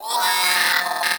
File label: Vocal Death; Hyena Micro